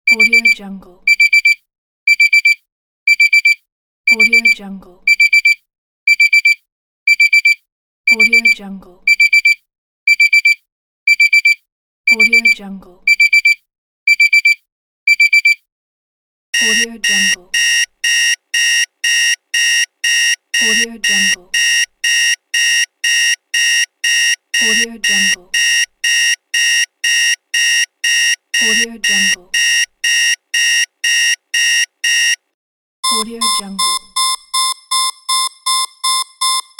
alarm clock

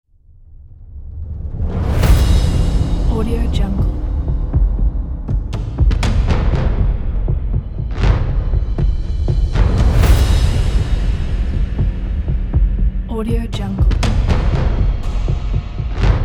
epic percussion

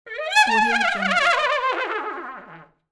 trumpet horse

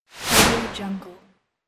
the slide

